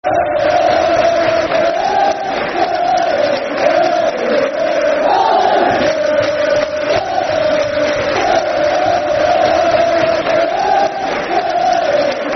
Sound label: Der Der Alex Football Chant; Crewe Alexandra Cafc